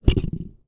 foley concrete block light movement wobble hard ground; zapsplat